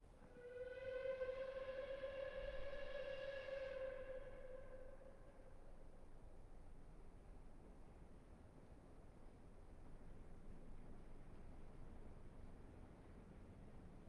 steamtrain approach with steam whistle in tunnel low pitch and pass by; mkh8040